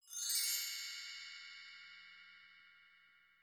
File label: Wind Chime; Burno; aronwk collection; ndaudio; creatures organic